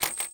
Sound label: Lock Latch Perc; Nova Sound